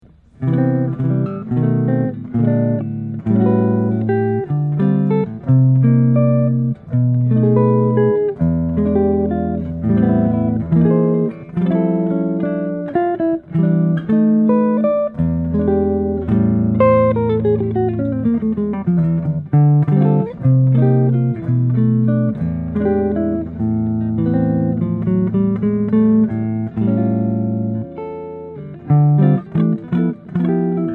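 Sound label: peerless monarch 16 set hb sunburst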